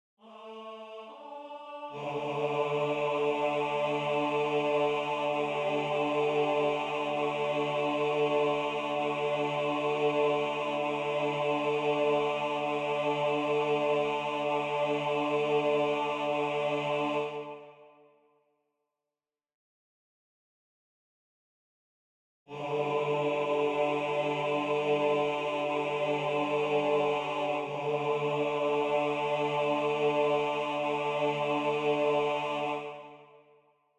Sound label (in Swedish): BAS2